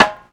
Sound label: snare scale; Drums; One Shots; Slate; AURORA Sample Pack